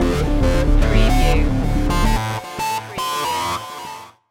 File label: future technologies stinger